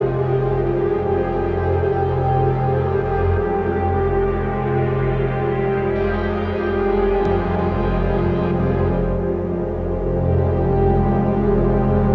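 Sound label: pad loop dramatic 150 F minor; Virtual Riot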